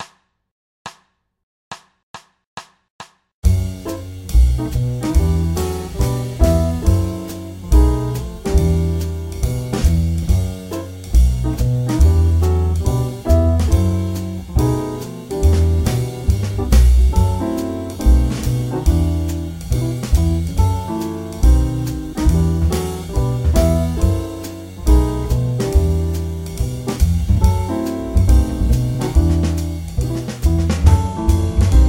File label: F#m7b5-B7-Em7 loop; 2bars Loop